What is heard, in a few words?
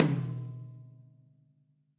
Tom Mid Ambient Loud Punch; Drum Hits; Standard Hits; Ableton; Factory Packs; Session Drums Studio